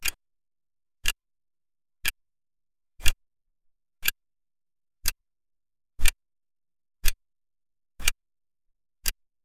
one click of clock

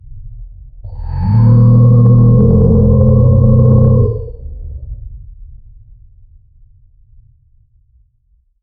a deep guttural roar that, bellow

